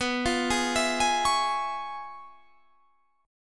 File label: C sharp 7th Sharp 9th, B, guitar chord